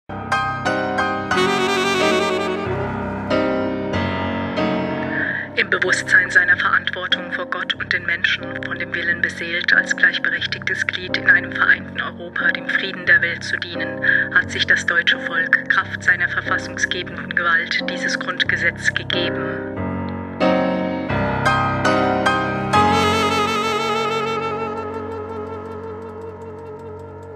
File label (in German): Ringtone